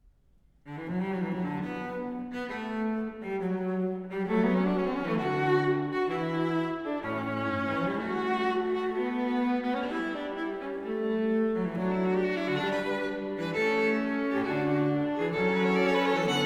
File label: String Quartet, Fuga in Gis Minor; Modern String Quartet; Das wohltemperierte Klavier Fuga XVIII; BWV 863